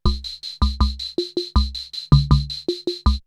CR78 R77 BeatBox, drumloops, DrumMachines

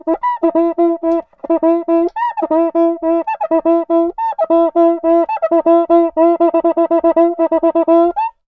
Cuica Samba 120 bpm; Best Service; Festa Latina VOL-1

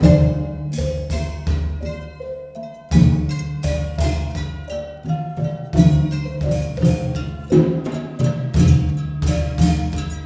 MALAY L, Heart of Asia; Spectrasonics - Heart of Asia CD2

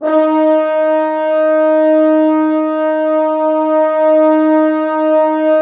Orchestral Brass

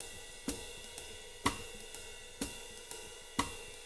SWING; Living Drums

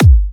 G, Kicks; markus hakala

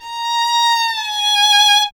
STR A#5, Solo Instruments Vln; Miroslav Vitous

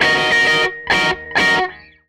Strat Guitar Loop; Sonic Foundry (Sony Creative Software); Six-String Orchestra

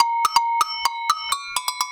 Percussion Loops, Brazilian; Houseworx

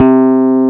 PUNCHREEDS; Wizoo - Powered DX

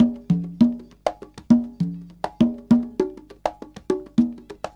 con, Percussion; Big Fish Audio - DOM

